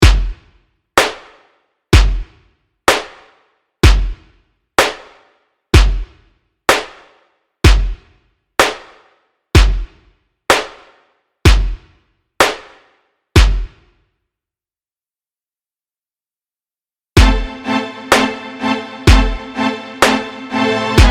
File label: Instrumental